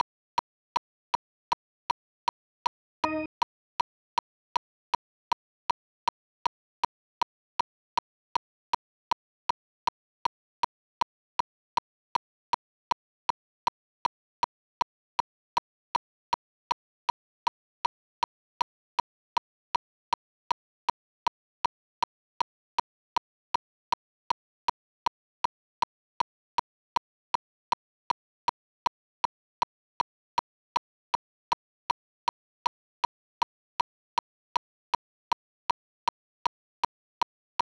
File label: click